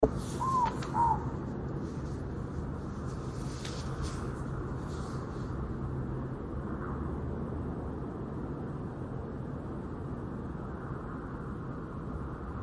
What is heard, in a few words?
ruru